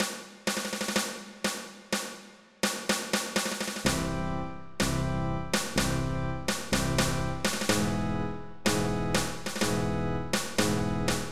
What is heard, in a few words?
you won